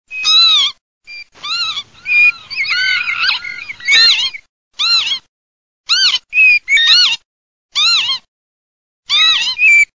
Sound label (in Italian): PAVONCELLA PIVIERE, birdsound